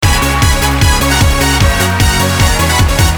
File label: Loop